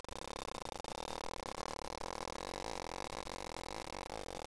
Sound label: sonar dolphin